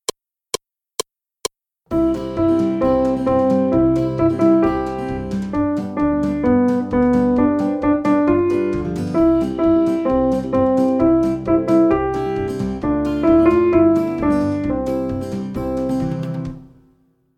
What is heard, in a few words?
Piano